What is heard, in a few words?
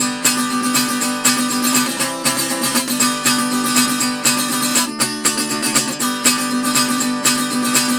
Tenor Guitar, Appalachian